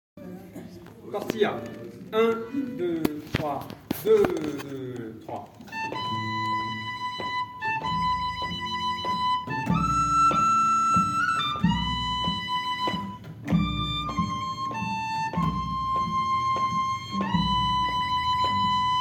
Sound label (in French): flutes; La Destimborlada